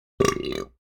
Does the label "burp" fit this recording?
Yes